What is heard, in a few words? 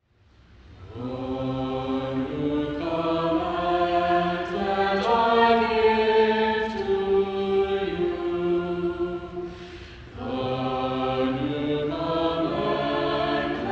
choir